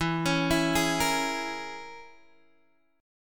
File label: E 6th Flat 5th E down Guitar DADGAD